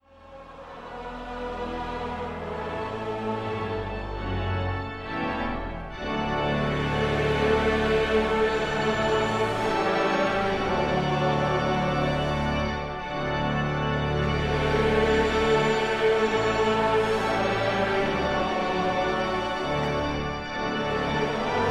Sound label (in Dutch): Kerstsamenzang; Ere zij God